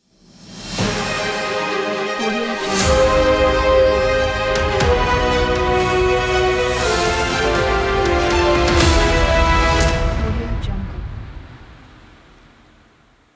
cinematic action logo pack; sonorius

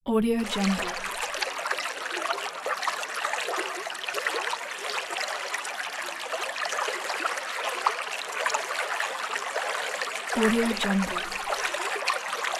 water flowing

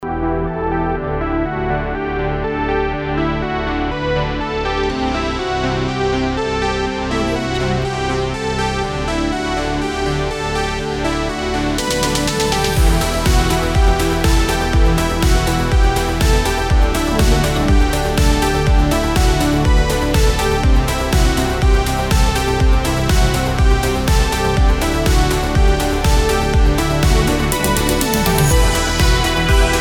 neon night 80s synthwave

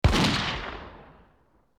single large gunshot; hollywoodedge

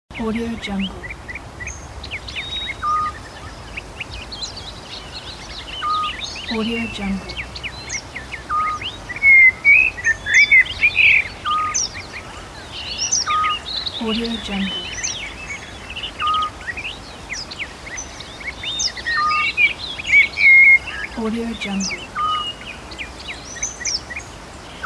forest ambience

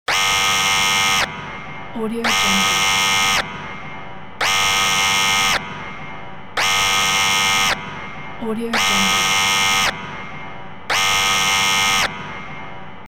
production alarm

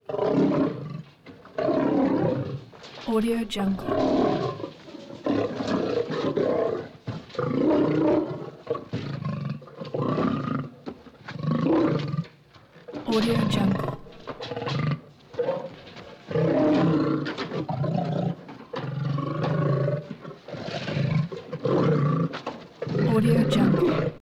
lion big guttural growls snarls

